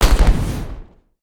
Flare Gun

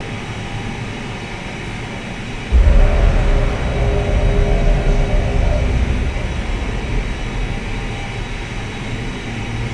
Refinery Amb Booming; nexus tower

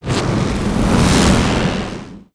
Lightning Swing; Spinjitsu

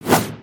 Brick Whoosh

